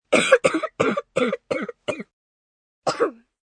Cough Multiple, Cough, 1 child, several, bronchitis, cough, human voice, kid